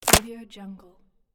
bone fracture

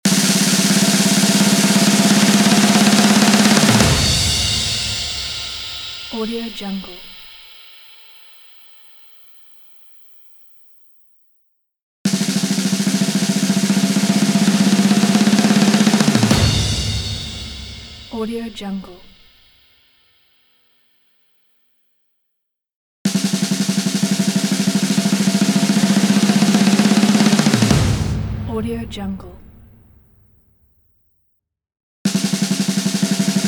drum roll

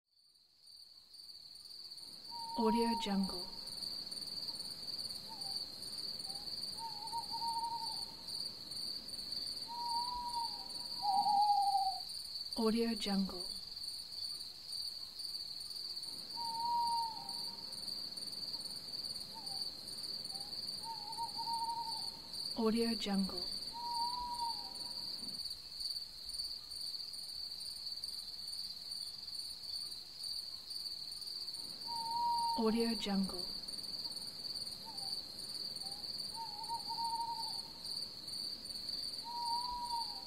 night ambience with crickets

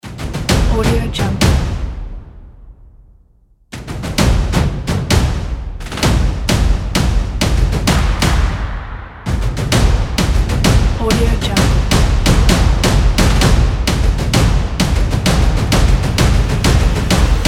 percussion

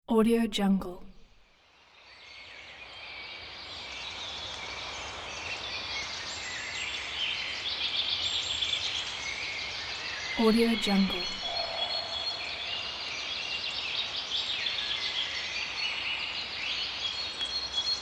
summer forest ambience

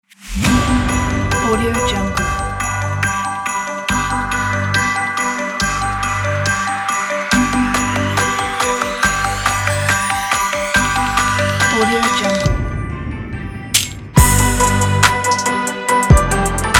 jingle bells trap beat

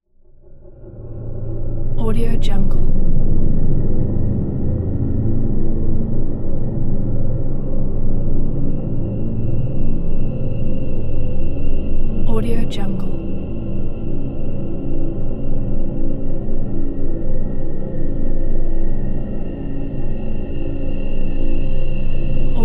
drone

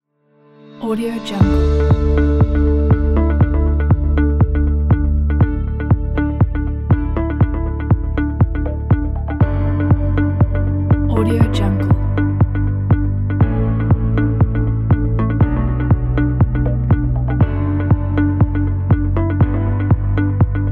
the ambient corporate background; ocean-studio

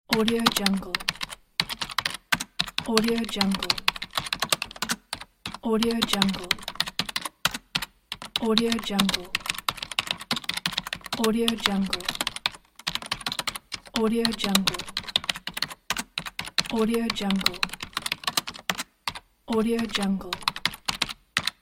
computer keyboard

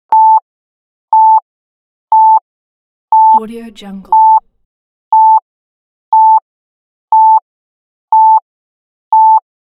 countdown beeps

